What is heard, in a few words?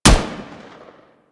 M16 Shot, rifle; Wraith